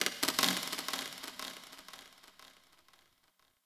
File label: Corpus Ship Metal Knocks Texture Small